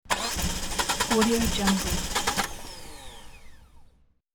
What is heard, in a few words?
false start cranking; hollywoodedge